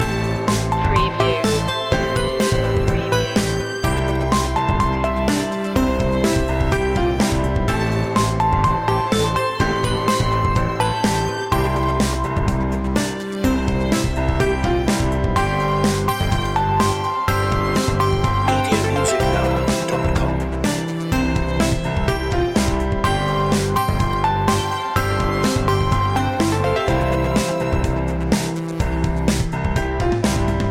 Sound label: summer drive loop